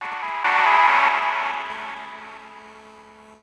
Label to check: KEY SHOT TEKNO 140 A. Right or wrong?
Right